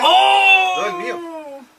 ohhhhhh

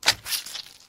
throat slitting

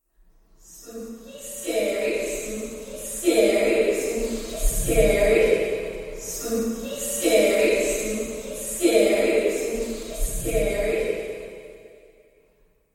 spooky scary spoopy sound loud

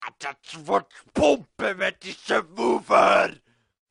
subwoofer

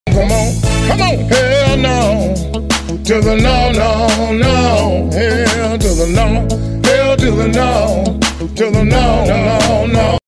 hell 2 da naw naw chorus

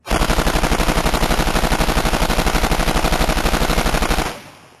machine gun

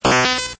death; keystone kapers

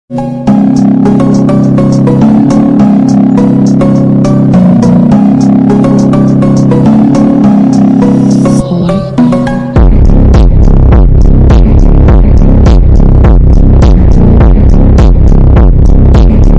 rave bass boosted; ohio

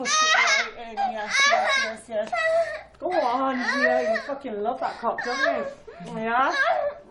aaaahahahahah

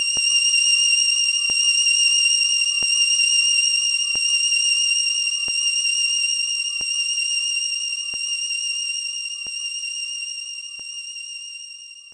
goal ring loop

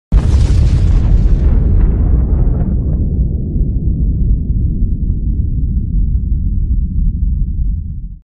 big explosion

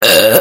burp

